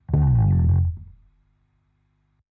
bass slide down fast; manabu murai works

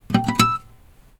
ag notification, acoustic guitar fx

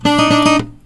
ag error, acoustic guitar fx

